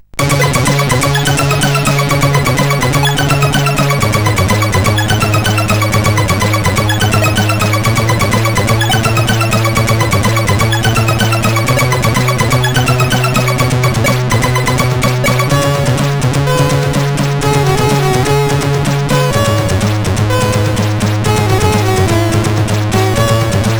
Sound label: sid CSG8580R5